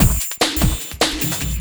Beat, Futurist Drum & Bass; Drums & Percussion

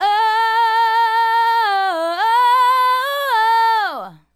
Female Solo, Hip-Hop and RnB Vocals

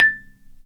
Marimba; Roland LCDP11 Africa VOL-1; Af.Marimbas

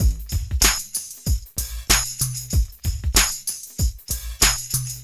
Club Tip, R&B Drums

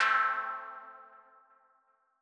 Mono DBeat A3; KG MegaSaur

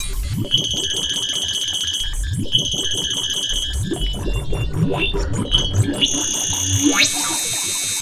NRG SFX, Trance

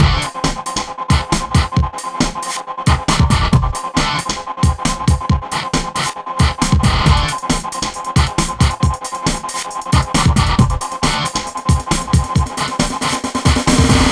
Hardcore Breakbeat, 136 Dmin; Big Fish Audio; Hardcore Breakbeat Action; Mission

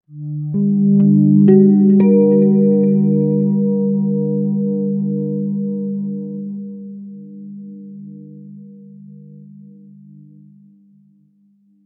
Guitar FX, Stringed Instruments, World Pop; Guitars; Sonic Foundry (Sony Creative Software)

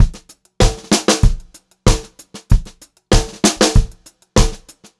VARI, Pop-Rock Drumloops

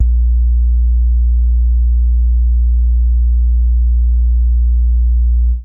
Analogue hits and octaves

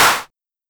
Simmons Clap

